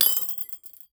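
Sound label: single plinko ball falling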